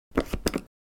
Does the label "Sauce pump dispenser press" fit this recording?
Yes